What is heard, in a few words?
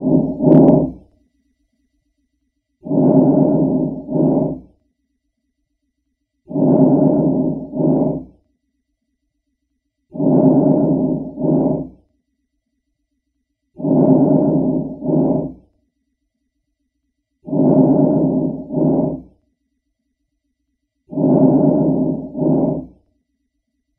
normal vesicular, respiratory; pediatric; pediatric-ai-scribe